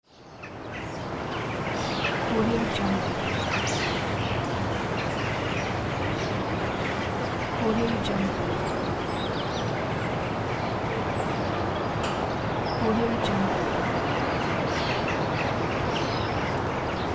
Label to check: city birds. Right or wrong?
Right